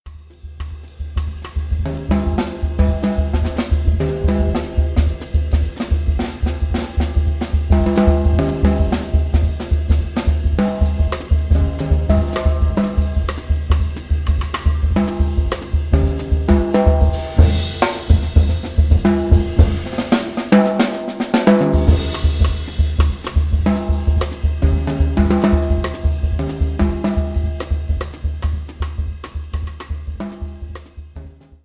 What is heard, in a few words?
rhythmic session samba jazz